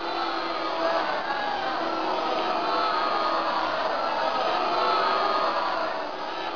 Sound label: crowd boo